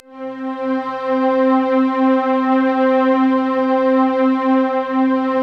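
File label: orchestra choir, Orchestra Choir Stack; Optical Media International; Sonic Images Library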